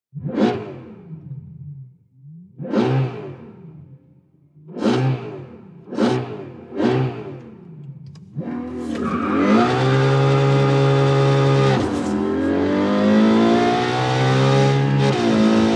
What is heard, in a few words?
ferrari four interior sound